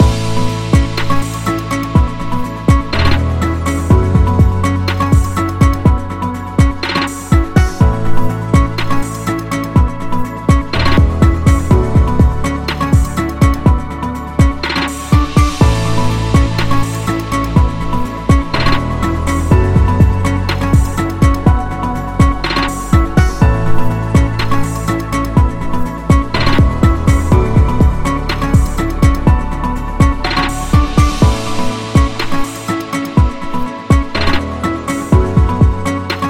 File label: Corporate Loop Positive; service business